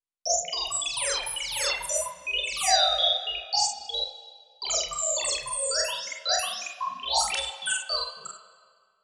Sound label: wipwop